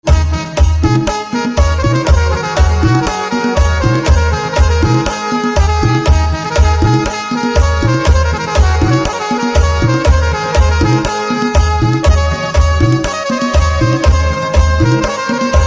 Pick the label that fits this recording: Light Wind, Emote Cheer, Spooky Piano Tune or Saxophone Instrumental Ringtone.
Saxophone Instrumental Ringtone